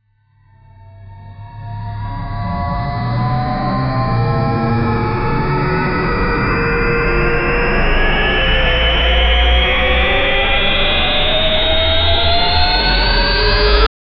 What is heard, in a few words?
godzilla charging; monsters